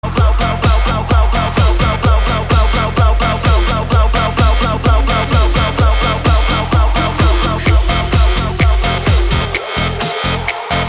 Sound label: Funana Beat; instrumentals from cape verde